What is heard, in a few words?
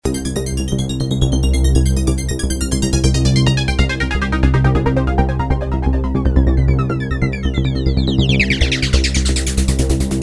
jingle; Spaceport